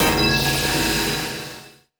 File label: start